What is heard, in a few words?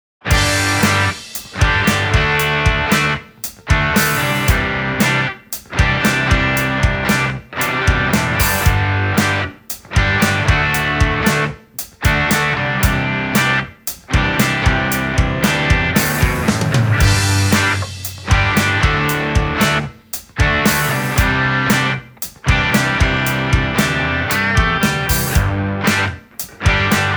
Driving Mid-tempo Rock